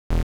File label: beep; firefox; fenix